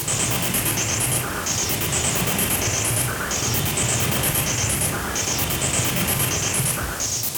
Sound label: Moving Noise 130, Tempo Loops 130bpm